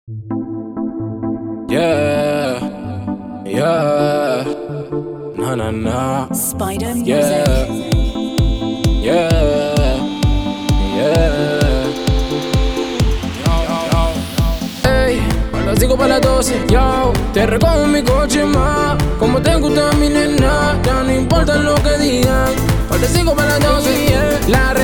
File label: Vocal House Mix